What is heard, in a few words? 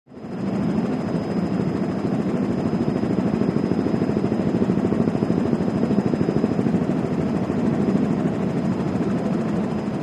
Helicopter Hover, Jet Ranger, In Flight Interior, with Blade Thwops